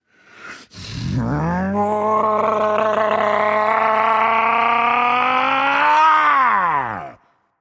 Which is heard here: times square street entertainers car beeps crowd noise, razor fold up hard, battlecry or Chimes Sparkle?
battlecry